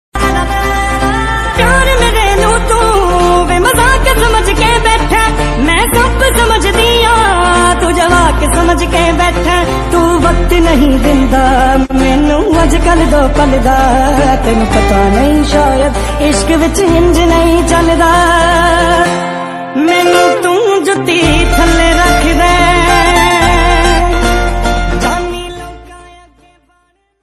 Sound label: love sad music ringtone